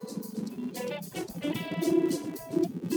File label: Latino